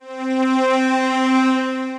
Strings Lo Ensemble, Roland RS-09; String Machines; Ableton; Factory Packs; Retro Synths

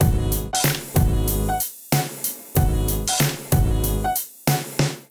Kit Melodic 94 bpm, Drums; MIDI Clips; Canvas; Ableton; Chop and Swing

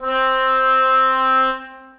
Oboe Section Legato Vibrato, Orchestral Woodwinds; Ableton

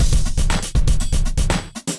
Acoustified Kit, Drums

